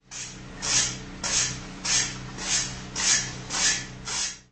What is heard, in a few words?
Sharpen a knife